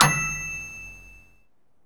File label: SE RESULT SCORE END; maimai